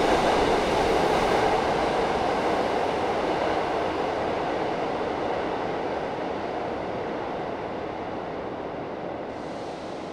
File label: SUBWAY; 1000fx